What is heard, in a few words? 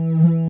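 Ambience Sci-fi Energy Loop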